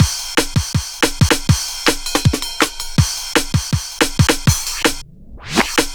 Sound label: 161 The Break, breaks, Loops; m8 breaks